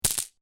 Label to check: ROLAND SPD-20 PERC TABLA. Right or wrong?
Wrong